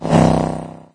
hoverbike whoosh; There; ThereClient

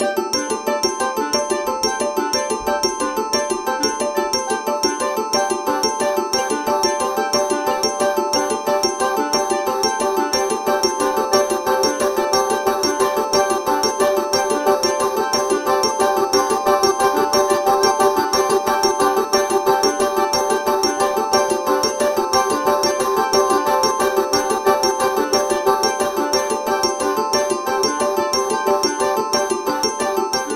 Polyrhythmic Ringer

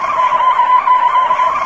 skid; There; ThereClient

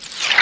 delete; Quicken

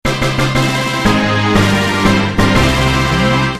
Victory Fanfare

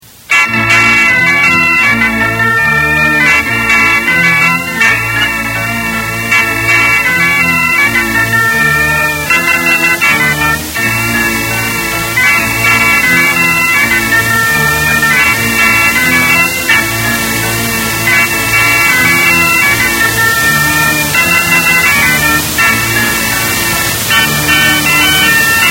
keyboard song

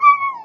whine, dog; There; ThereClient

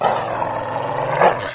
growl, dog; There; ThereClient

